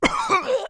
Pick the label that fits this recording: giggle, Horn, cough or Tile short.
cough